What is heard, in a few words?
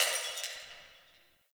Distant Glass Breaking